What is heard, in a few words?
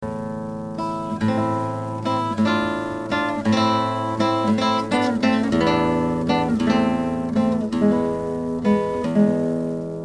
Guitar